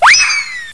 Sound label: slip